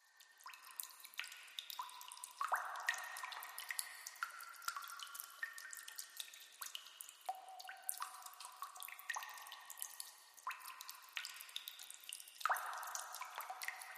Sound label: Sewer Ambiance, drips